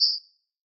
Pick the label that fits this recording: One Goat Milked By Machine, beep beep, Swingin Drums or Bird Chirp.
Bird Chirp